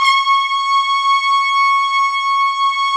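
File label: BRS Trumpets Section mp; Roland LCDP06 Brass Sections; Tpts Sec.1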